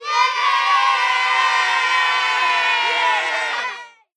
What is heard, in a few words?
kid cheer; halloween